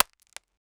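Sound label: equip belt with metal buckle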